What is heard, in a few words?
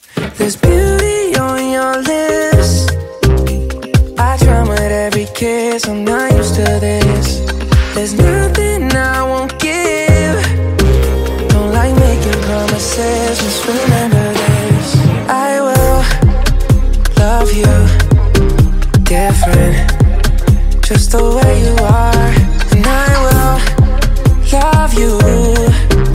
ringtone english ringtones